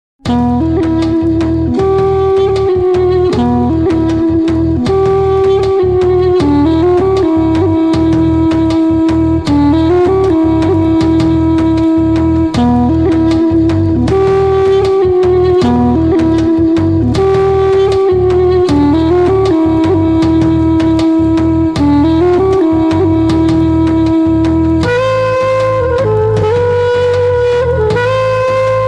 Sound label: love bgm; paruthi veeran; karthi